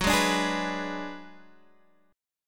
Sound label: B Minor 6th Gb